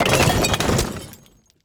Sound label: wood rock glass debris